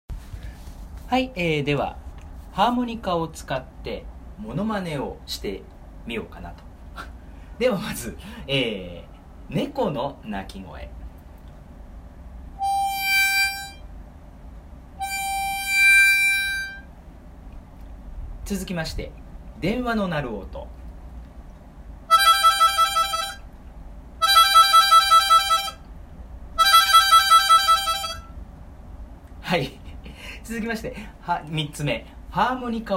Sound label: harmonica monomane